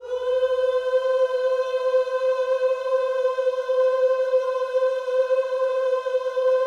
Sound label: OOS C, Vocal Gospel